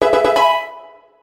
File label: Level Up; GEN8- LevelUp BW; infinitefusion